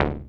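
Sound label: synth, 140 BPM, Drum & Bass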